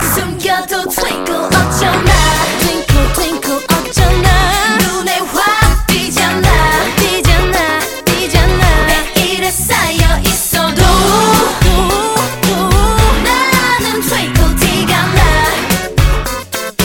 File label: Twinkle